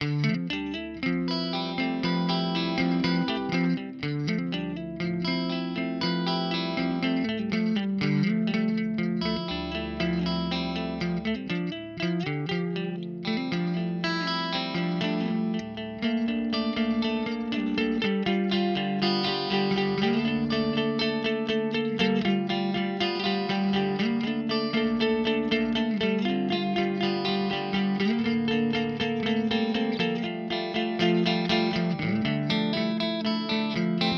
TWN Vibrato; Neural DSP Quad Cortex

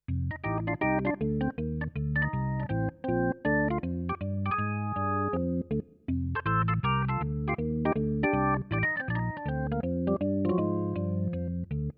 Kawai ES7 Organ

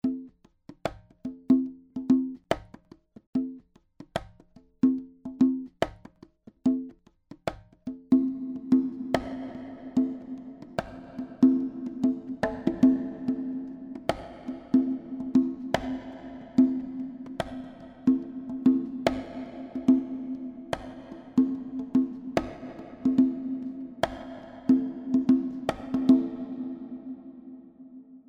Random Hall

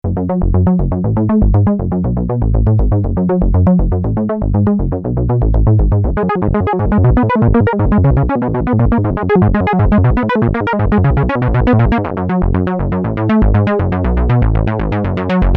Subsequent 37 Square Sequence

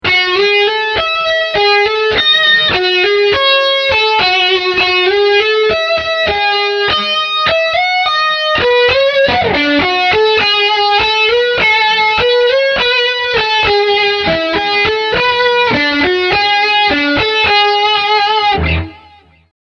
Solo Bridge Humbucker

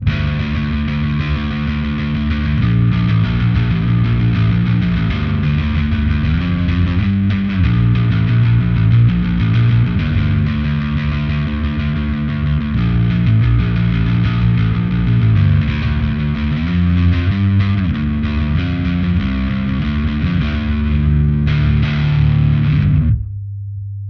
Metal Cabsim

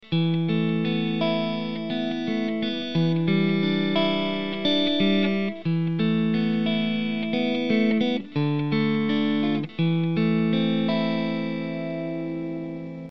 Clean Middle

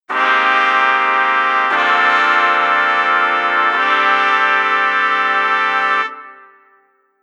Smooth Trumpet Section

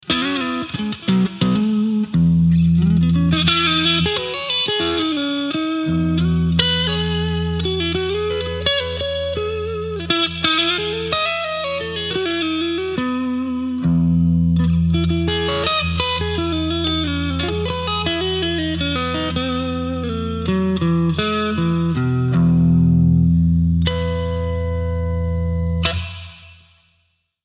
Clean Bright Reverb

